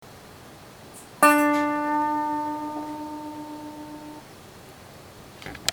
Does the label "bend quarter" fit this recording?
Yes